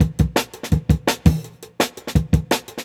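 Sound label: Jungle Breaks, 168 bpm; Original Jungle Breaks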